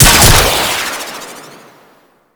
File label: Hand Rocket Launcher Shot 3D, Weapons; Warframe; Corpus